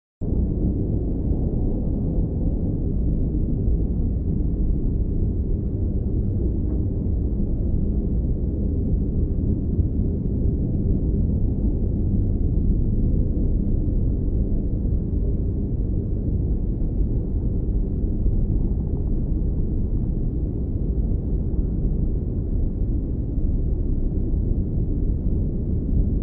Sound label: Underwater Rumble